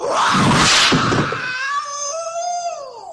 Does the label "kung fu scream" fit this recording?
Yes